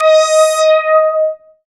SYN RESO, Analog; Sound & Vision - Gigapack I CD 2 (Roland)

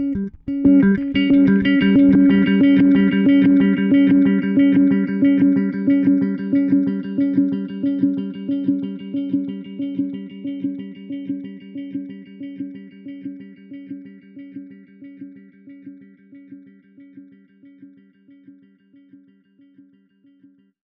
Delay, Bass; Bass X

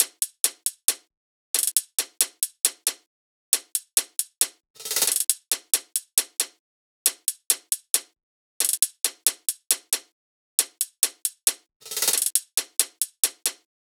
loop hat 136; Seismic Loops

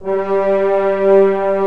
WINDS & BRASS

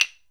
HYOSHIGI, JAPAN PERC; AKAI S6000 CD-ROM; WorldSounds

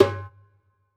Dumbek; Essential Percussion

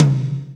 TOM TOM, Roland

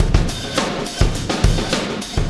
Wormhole Beat, Processed Drumkits

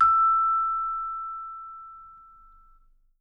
E6, Percussion & Harp; Miroslav Vitous

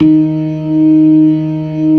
ORGAN, LOWER MANUAL

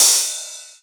Rides, Cymbals

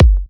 F#, Kicks; markus hakala

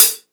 hat closed; Sonic Foundry (Sony Creative Software); Ugly Remnants VOL-2; Beatstuff

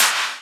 Claps; Vengeance; Essential Clubsounds Vol.4

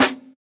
Snare, JUNGLE BREAKS

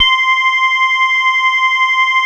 Korg POLY800 C5; Back In Time Records; Korg Universe VOL-1

